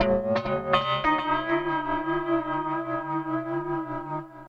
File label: A#min, Guitars; Total Drum&Bass; 90 s Sample CDs